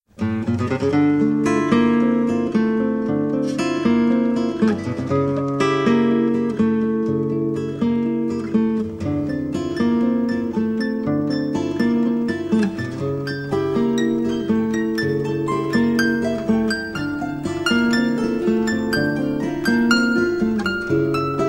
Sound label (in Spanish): Variación de milonga